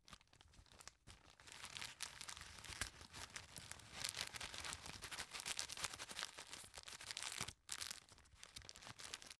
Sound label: tissue stuff in package